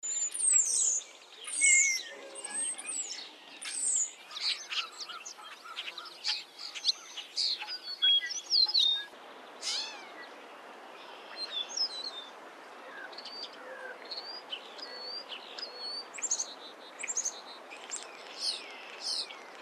STARLING EUROPEAN

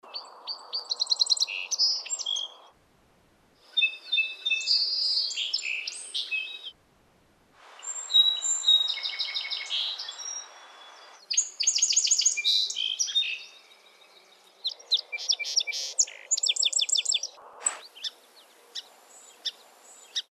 sparrow song

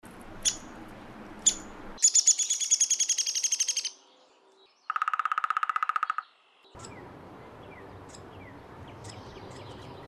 woodpecker downy

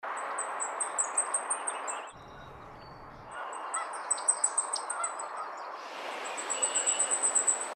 WARBLER BLACKPOLL

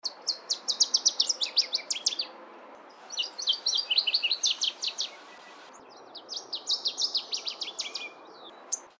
waterthrush northern

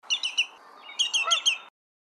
yellowlegs greater

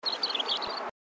sandpiper least

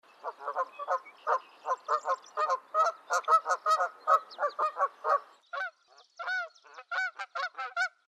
goose canada

